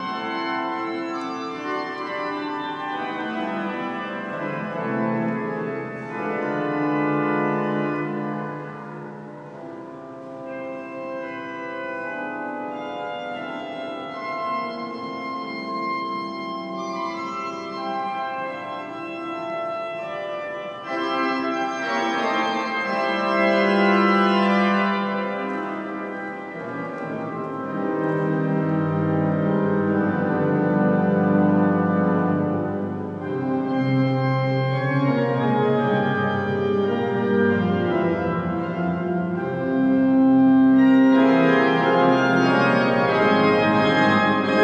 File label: cathedral pipe organ in Morelia Mexico; for my kickstarter project